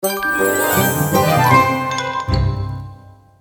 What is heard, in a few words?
freespin end; games; Beauty Fairy